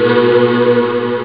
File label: choral, synth; OctaMED SoundStudio V1